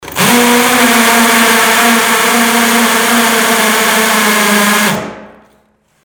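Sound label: drill sound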